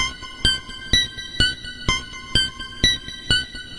tinkle teedle twiddle teep, 127; SYNTH AND ELECTRONIC LOOPS

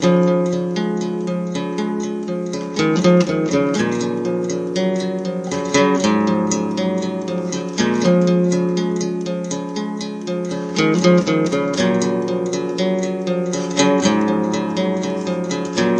120 fade guitar, Folksy Guitar; REMIXABLE COLLECTIONS; Folksy Guitar and Voice Stems

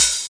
cuts through it ohh, hats; DRUMS (SINGLE HITS)

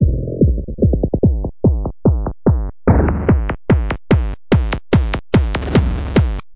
145 tekno filter up; 141 to 160 bpm

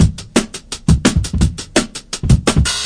168 muted swinging dnb; 161 to 180 bpm

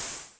Syn Dr, Overloaded Synthetic Drums; style kits

